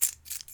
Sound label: Cutting Keys